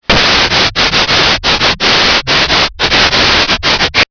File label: static long